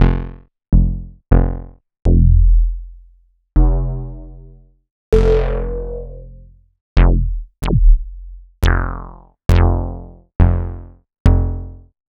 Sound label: Roland MKS-80 Bass